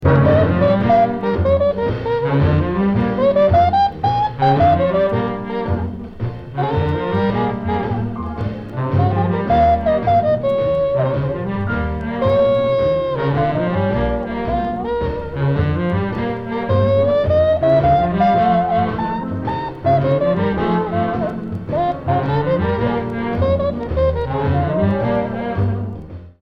solo

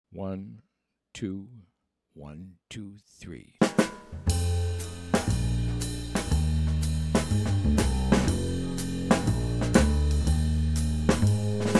fast minus piano

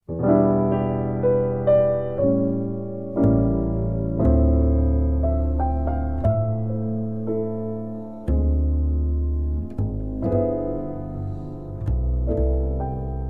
minus melody no drums

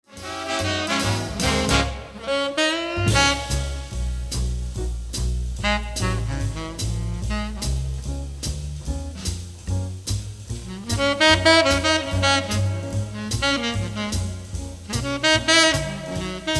ALT solo

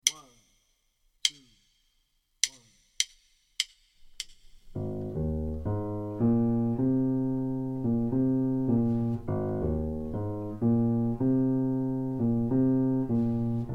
minus Bass

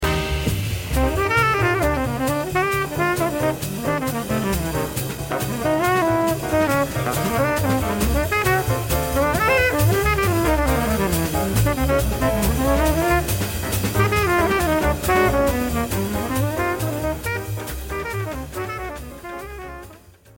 solo

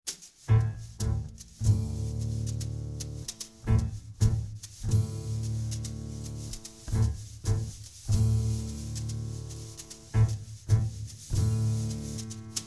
bass blues power trio

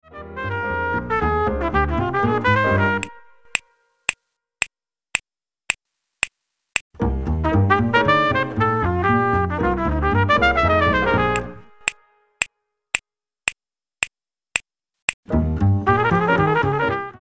medium up minus Drums snaps

